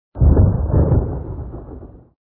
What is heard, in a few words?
lightning clouds